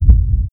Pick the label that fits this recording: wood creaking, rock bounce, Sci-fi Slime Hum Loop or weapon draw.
rock bounce